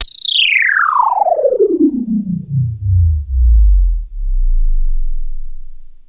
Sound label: JUNO 106; Masterbits; Vintage Voltage; SEQ-FX